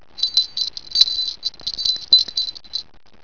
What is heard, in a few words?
Suzu